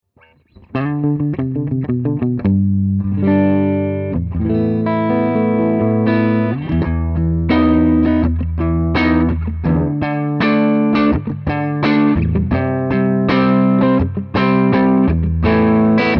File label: Clean Les Paul